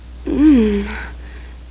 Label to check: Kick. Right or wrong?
Wrong